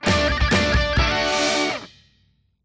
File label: Small Celebration; Disney Infinity